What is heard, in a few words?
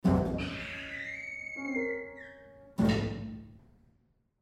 jingle